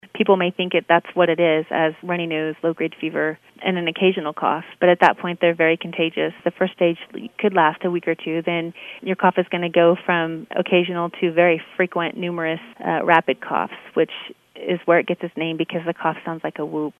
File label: whooping cough; Goss: The Sound You Do Not Want to Hear, Whooping Cough